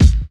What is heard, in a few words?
Kick, Drums & Percussion One Shots Drums